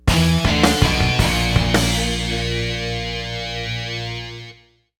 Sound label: Defeat; WarJetz; PS1, PS2